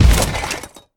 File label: step